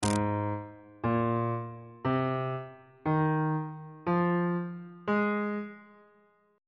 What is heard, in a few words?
g sharp major pentatonic scale on bass clef